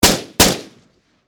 Warfare Machine Gun Sig SG 551 Double Shot Close Perspective